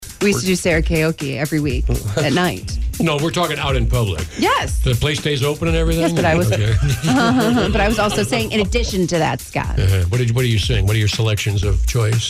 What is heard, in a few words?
Singing